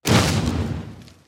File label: explosion; Cybuilder